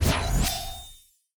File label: close combat cold sweep massive; CrossCode; battle